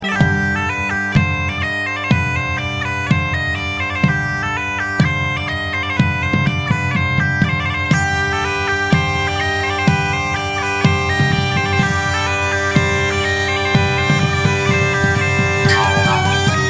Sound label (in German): Schwedischer Bourree